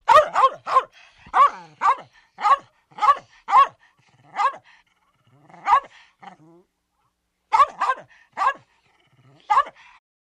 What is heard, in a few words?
Dog Small Angry Barks